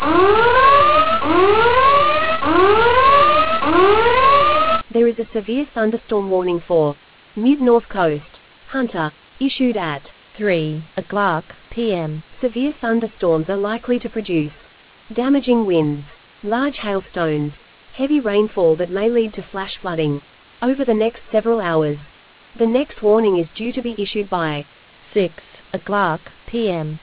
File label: storm audio